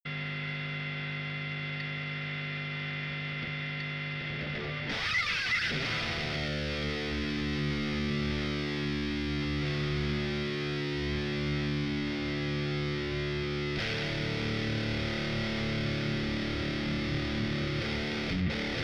Amp Room Ambience; Softube Amp Room Bundle

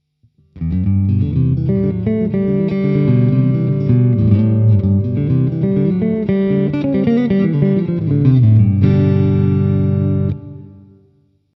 front pu coil split; ibanez artstar as153b

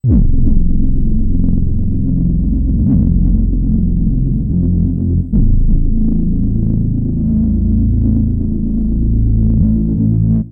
kurzweil k2700; shifted planet

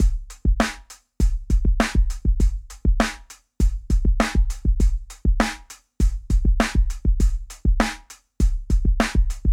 addictive drums; sonar platinum; addictive drums 2

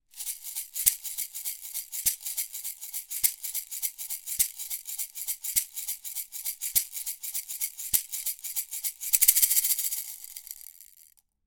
neumann tlm 103 shaker

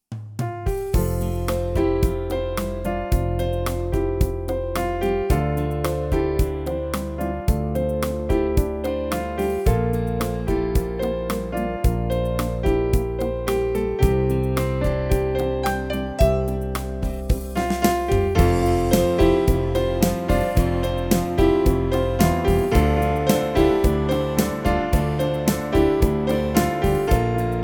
yamaha csp-170 style classic 8beat

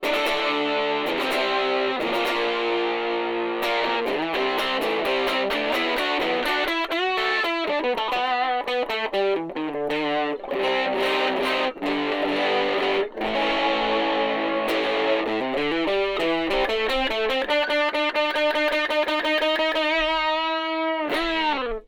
Strum Buddy test distortion chorus; fluid audio strum buddy test

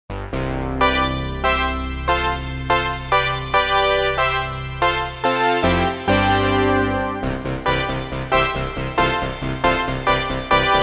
alesis prestige artist

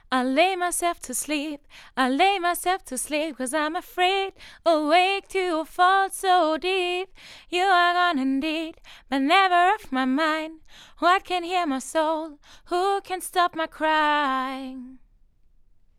t.bone sct 800 vocals

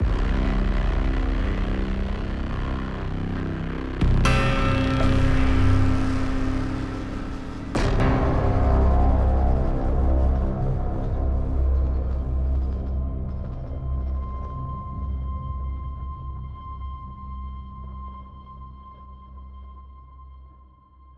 melodic hits; heavyocity aeon

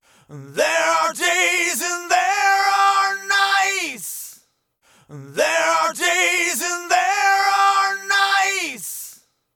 summit audio grand channel vocals dry; softube

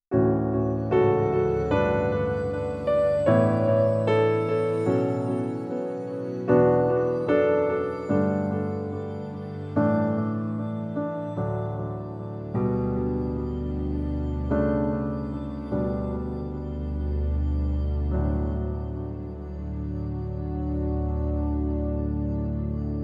nord piano 4 layer piano delay pad

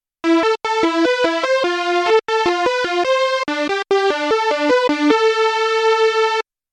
osc detuned filter; Lead-Sound erstellen am Synthesizer

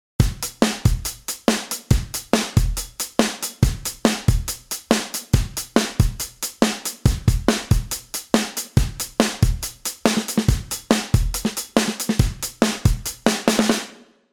big drums mpressor

